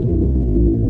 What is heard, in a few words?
drone